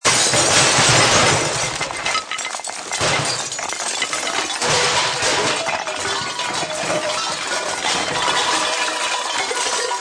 Long Junk Crash, Long, continuous junk crashing, smashing; Glass, metal, pots and debris being disturbed and thrown around.; Crash Sound Effects